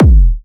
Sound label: Techno, Kick; Techno Sounds 2024